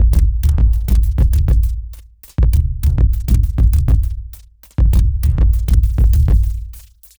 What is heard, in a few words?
Space Cowboy, Ethereal Textures, Beats; Sonic Foundry (Sony Creative Software); Ma Ja Le Ethereal Textures